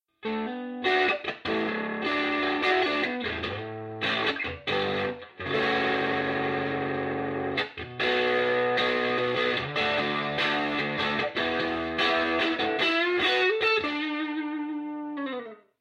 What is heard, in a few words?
overdriven